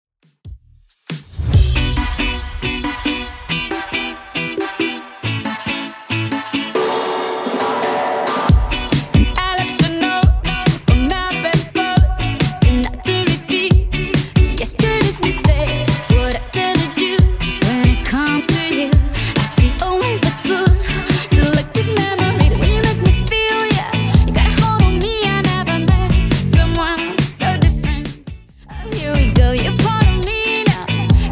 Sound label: 138bpm